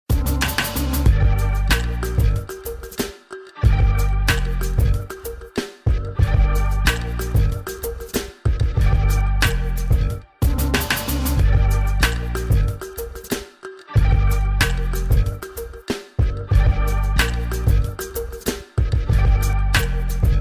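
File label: instrumental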